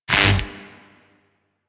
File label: Mining Laser Fire Stop; Weapons; Eidolon Plains; Warframe; Eidolon Mining Lasers